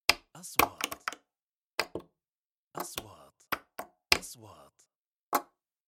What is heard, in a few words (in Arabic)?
نغمة كليك; transitions